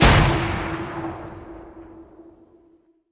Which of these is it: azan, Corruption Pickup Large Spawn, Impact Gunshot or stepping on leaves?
Impact Gunshot